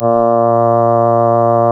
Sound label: REED A#; Big Fish Audio; Synclav FM